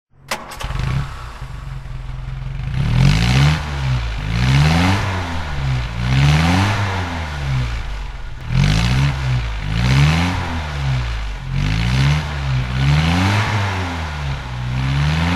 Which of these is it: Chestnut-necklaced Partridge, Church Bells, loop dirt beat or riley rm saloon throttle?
riley rm saloon throttle